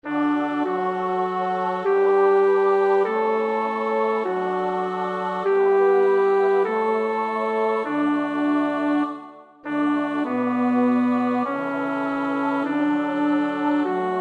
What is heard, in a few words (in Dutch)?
bas; Nieuw Liedboek; NLB 464